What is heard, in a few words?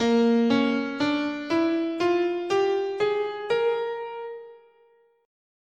Bb Hungarian Major, scale